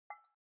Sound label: elements water dabble single glas bowl soft; smartsoundfx